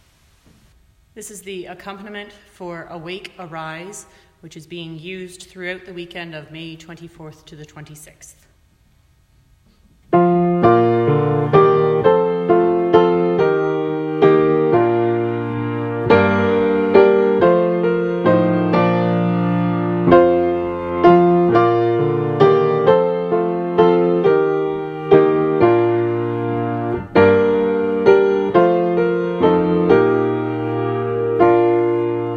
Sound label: Awake Arise Accompaniment